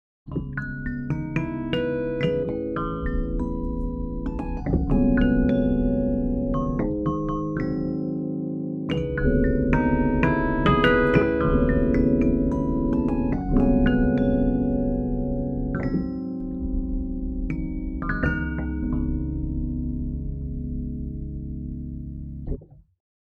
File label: 3rd Harmonic